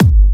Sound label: Hard Kick; Vengeance Samples